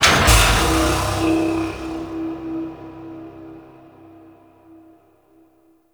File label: Dark Machinery Air Burst; Warframe; Tau